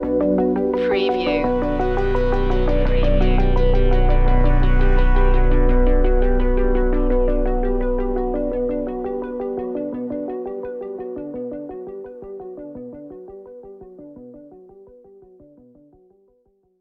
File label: electronic thriller stinger